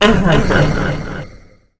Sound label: ice queen throw; npc